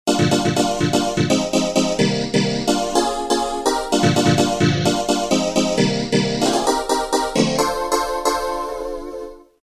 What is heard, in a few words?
Ravin' Chords; Roland M-DC1